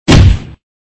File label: low impact